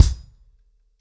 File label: 90BPM, acoustic drums, drumloops